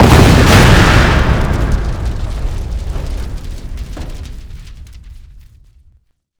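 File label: tank explosion large; WAR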